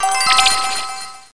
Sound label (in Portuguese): plim; 7invasores